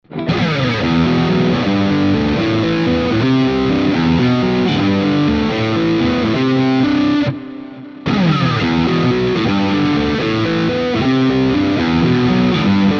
lead all positions riff

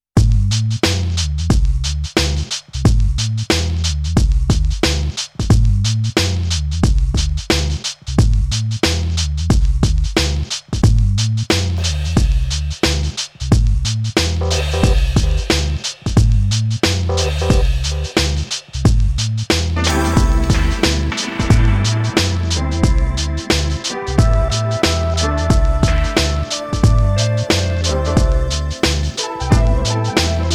Boom Bap Style

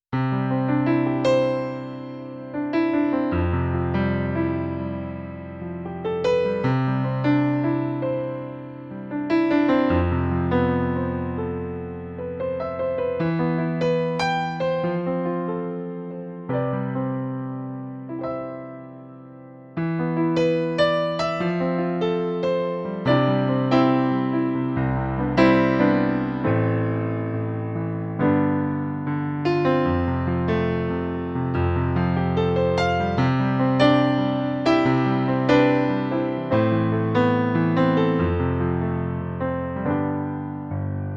CLP470 Concert Grand

